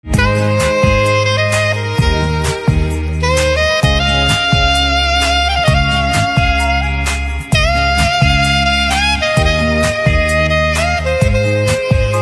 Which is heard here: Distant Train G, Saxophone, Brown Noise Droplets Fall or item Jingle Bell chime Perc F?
Saxophone